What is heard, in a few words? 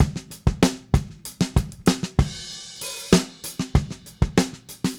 Drums Break, 70s Analog Funk; Sonic Foundry (Sony Creative Software); What it Is! 70s Analog Funk